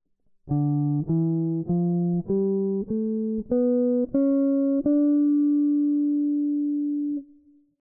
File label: jazz guitar improvisation; practice routine